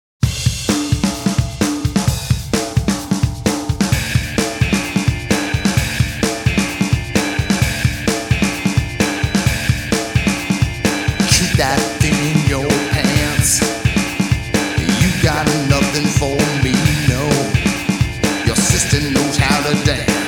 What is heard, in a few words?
Drums In The Mix